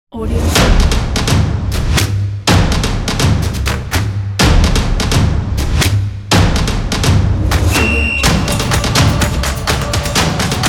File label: football